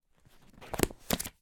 paper pack flick through sheets

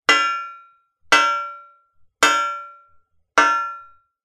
impact metal rsj steel light impacts x4 on top

